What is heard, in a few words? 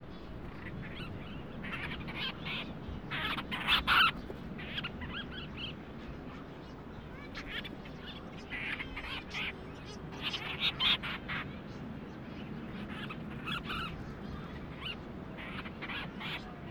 Monteiros Storm Petrel